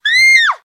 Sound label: Female Scream Shortened, End